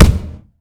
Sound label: attack; dota; marci